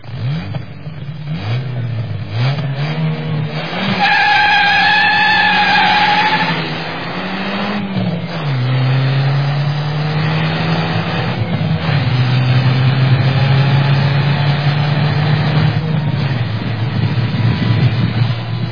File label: start and rev motor squeal tires and progress through the gears